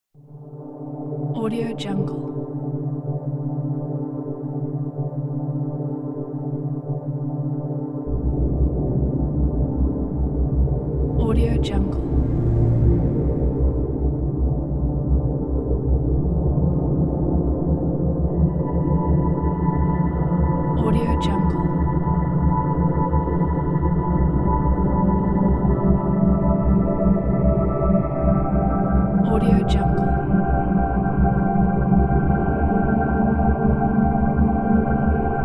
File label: creepy background